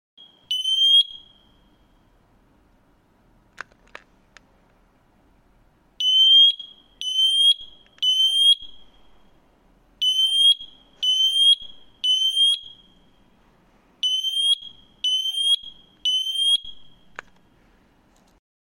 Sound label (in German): alarm, rauchmelder